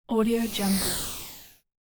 whoosh; minimal studio

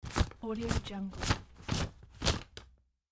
counting money

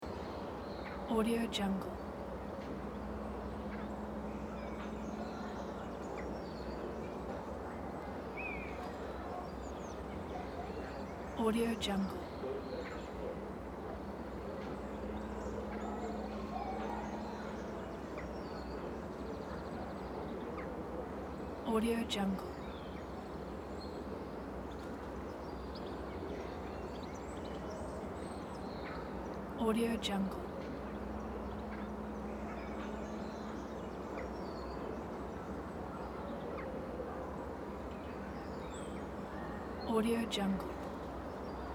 market village ambience loop

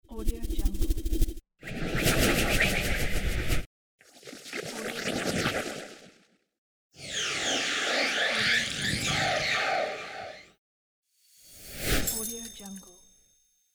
swirl transition

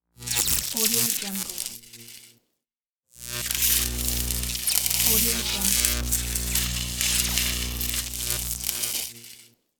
electric zap

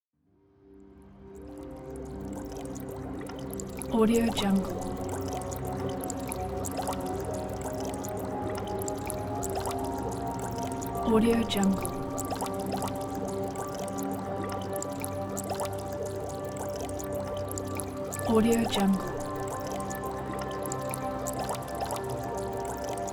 mysterious scientific laboratory

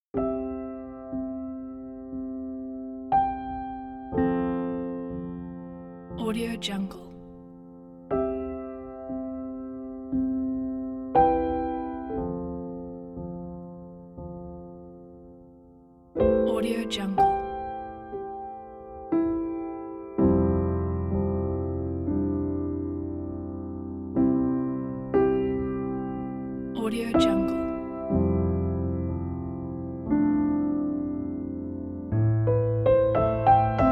calm piano

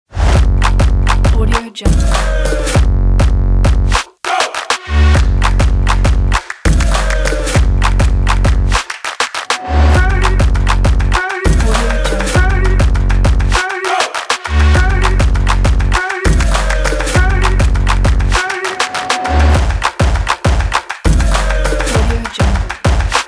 action trap sport percussion

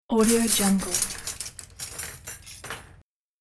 production element metallic noises

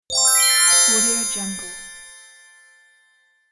icy bells trill up down; electronplanet

